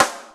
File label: DIK SNARE, DRUMS; East West - Global Sounds